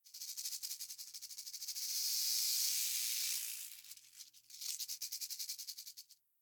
Rattlesnake; Devour; The Town; 3D Environment Clip

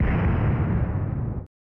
Explosion

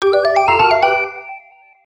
Game Unlock; chick chick boom chicken battle; wiiware

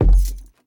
Footsteps Wood Walk; The Town

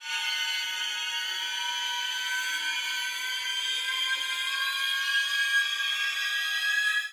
Riser; Mus Npc Timedattack Riser

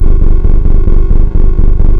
amb machine loop trippy

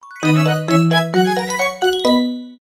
Ranking Up in Fashion (Jingle); miitomo gamerip 2016 android ios mobile

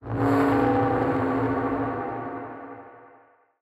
Skill2 Magic; portal quest; android; Magic Shrek

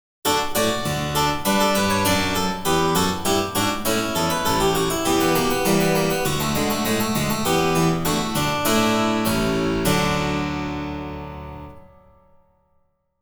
BGM Classical

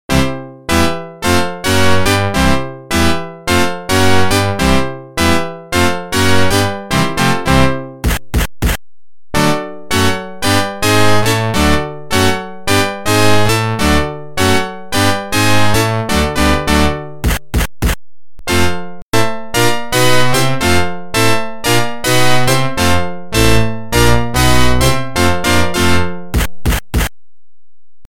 Organ; NHL 94

